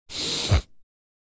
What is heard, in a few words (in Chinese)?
擤鼻涕